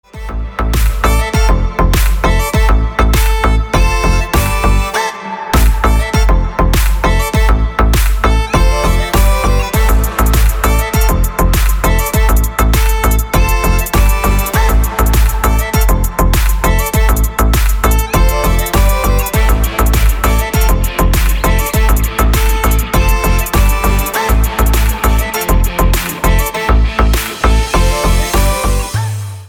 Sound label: scottish skripka